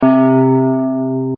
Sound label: pluck, nordlead2